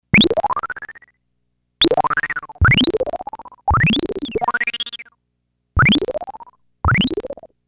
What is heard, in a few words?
Water Wiggle